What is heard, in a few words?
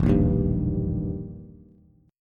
E Minor Major 7th Flat 5th, Bass; strummed; 0,1,1,0; JGuitar